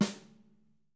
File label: YAMSNARE, Drum Kits; Sonic Reality